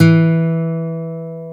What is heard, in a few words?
GTR E3, Dual Guitar, Roland; Club-50 - Foundations